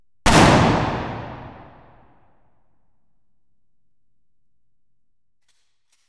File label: Explosions, Gunfire & Cannons, Pistols & Rifles; Telarc Sampler